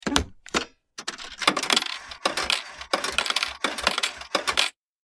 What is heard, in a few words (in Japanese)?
自販機 お釣り出る